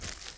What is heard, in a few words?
Rustling Grass